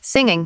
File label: singing